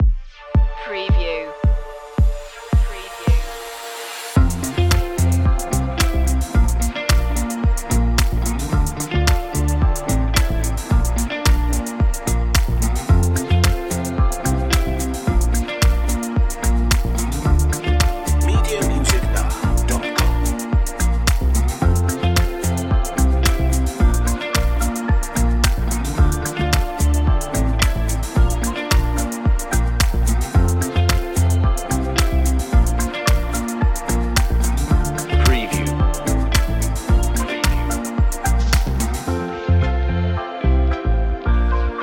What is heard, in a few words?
summer fashion house